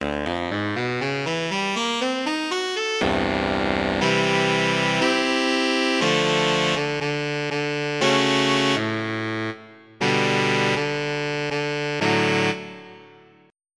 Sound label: Baritone Sax; EverQuest